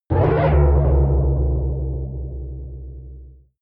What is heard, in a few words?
protective shield hit absorption